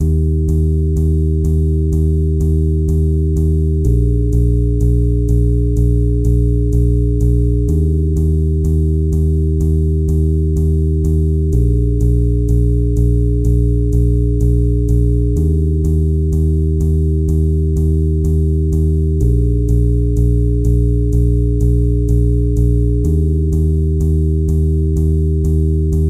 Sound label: Zen Waves 1 to 5 in Eb; Mike Cirillo; Musical Self-Actualization; Mike Cirillo Method TMSA